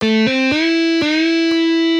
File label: Guitar solos